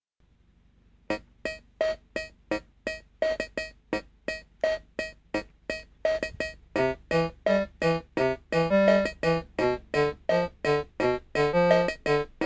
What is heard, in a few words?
Piano; Cat333Pokémon